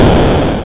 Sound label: Explosion; Arkanoid